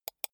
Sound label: computer mouse wheel click soft